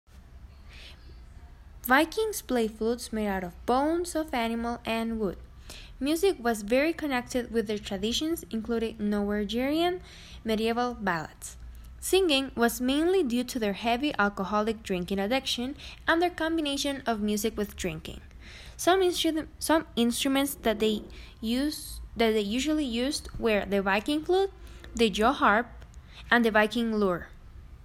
Vikings music

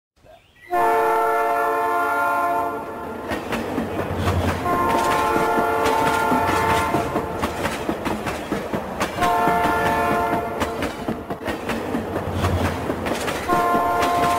Sonido de Tren Train Sound

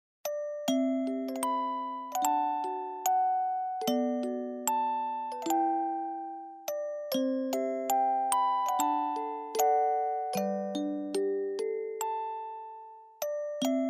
emotional ost instrumental; emotional ost collection

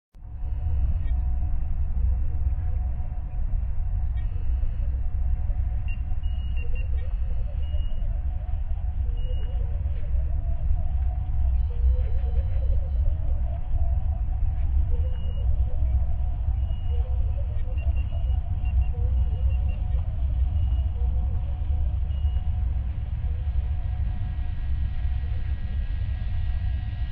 Spaceship Cockpit; Sound Effect

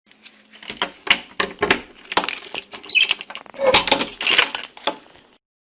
Key opening door; Llave abriendo puerta efecto de sonido

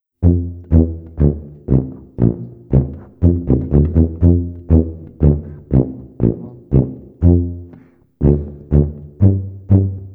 Tuba

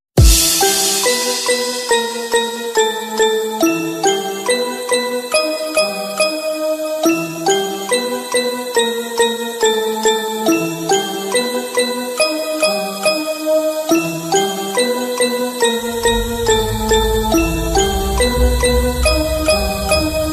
Halloween

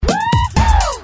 woohoo

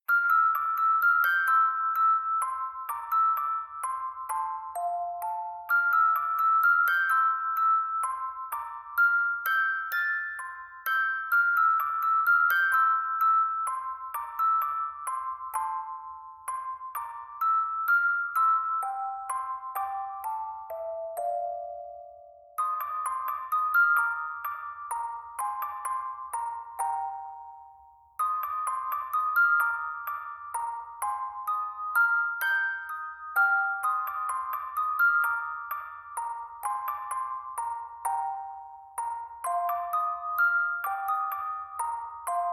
Music Box; Anime PandoraHearts Insert Song

